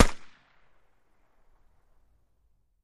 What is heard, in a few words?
Gun Shot Sngl Fire